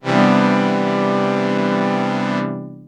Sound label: Strings, dx7